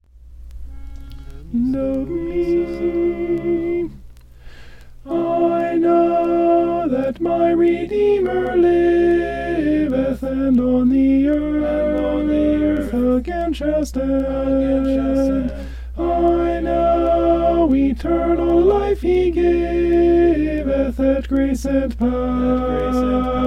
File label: alto; i know that my redeemer liveth